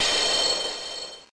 Metal Sound; pokemon reborn e19 2022

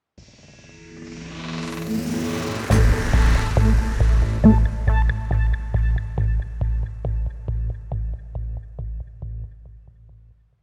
69BPM; Spaceship3StageGameStart

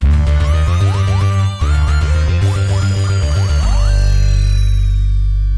Ball Lost Jingle, pinball; Doctor Who; Bally

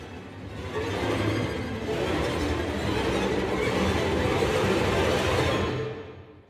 scare FX; hot lava; ios macos windows

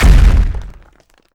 Clam Shatter; Nicktoons Nitro; arcade

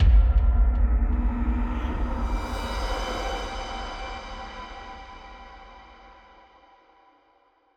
Stingers sting misery

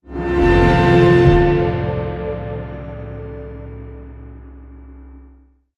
Exploring Day End Stinger

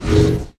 Interface

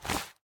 flap; ice age scrats nutty adventure

